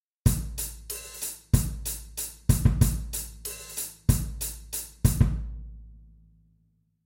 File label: cymbal